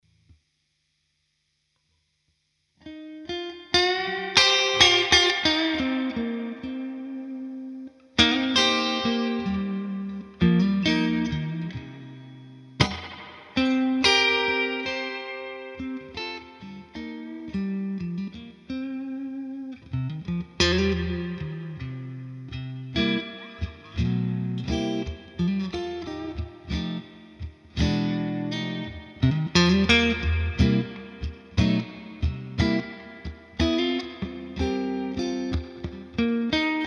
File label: clean del mid, strat